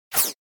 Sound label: Whoosh Fast Sci-Fi Small Air; Hollywood Edge; sound designer's toolkit v2